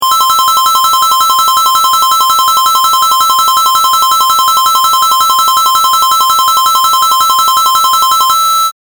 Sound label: coin